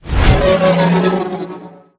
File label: brake; lfs; vulturedroid